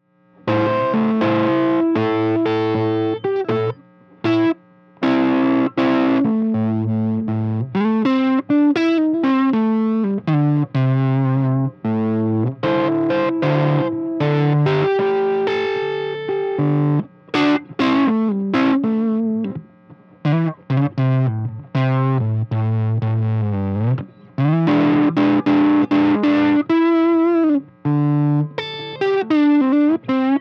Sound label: strat stock delta blues; victoria-518